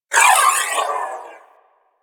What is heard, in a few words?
Briefcase Akimbo Pistol Alt Proj Whizzby; Warframe; Weapons; Corpus